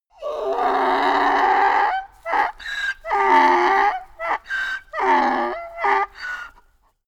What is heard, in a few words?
donkey scream